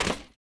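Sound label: footstep Stone; droids robots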